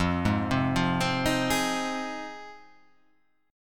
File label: F Minor 7th F